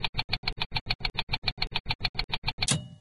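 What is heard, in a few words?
catapult reload; Minecraft; Votive Content; wows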